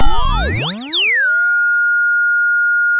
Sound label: sound of a bike bell